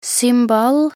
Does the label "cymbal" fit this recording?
Yes